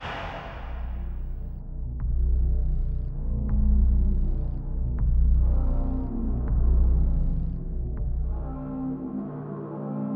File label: Blood Pulse